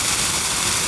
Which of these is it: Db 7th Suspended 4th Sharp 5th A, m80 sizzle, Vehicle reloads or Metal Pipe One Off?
m80 sizzle